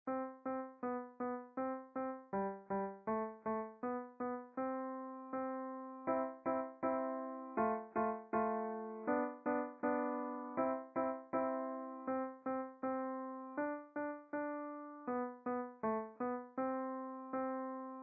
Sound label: Legato Staccato, beginner piano music; Bingo